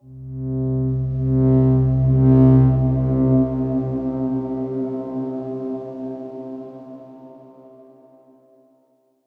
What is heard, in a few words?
Darkswarm C1 pp; HSS Ableton Pack1